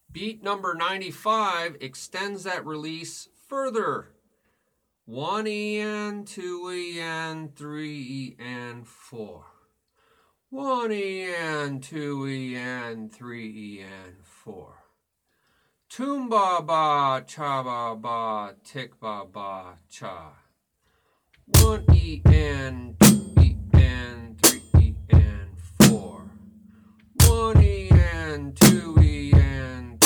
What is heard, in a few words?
Beat 95 1e 2e 3e 4 Toom Ba Ba Cha Ba Ba Ti Ba Ba Cha